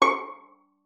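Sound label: violin pizzicato C#5; Xsample VOL-5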